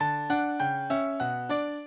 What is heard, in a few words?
minuet, mozart